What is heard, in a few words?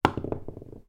office eraser rubber drop on desk